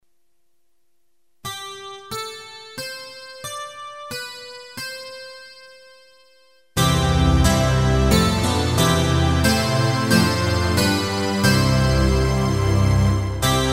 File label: instrumental